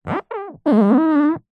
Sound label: office whiteboard rub finger squeak single hard